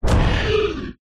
horror monster creature impact growl squeal

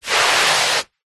foley foot scrape long scuff carpet

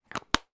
household water bottle sports plastic lid close

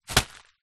foley plastic bag stuffed with paper drop; zapsplat

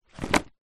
household books pile set down on surface

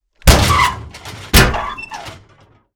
Metal grate open with hit

